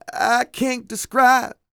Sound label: SING, Vocal Planet R&B & Dance; ILIO